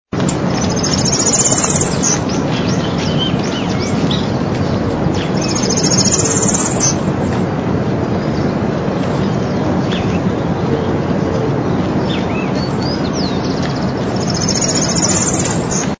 voz pitiayumí

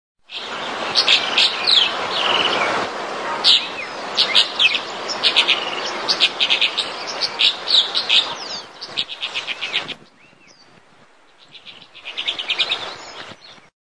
voz golondrina doméstica

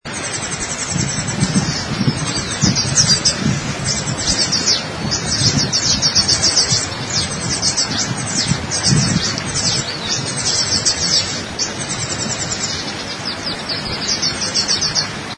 voz cabecitanegra común

